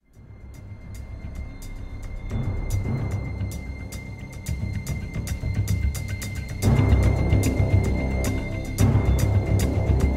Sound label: Suspense Theme; The Amazing Spider-Man; PS Vita, PS3, Windows, Xbox 360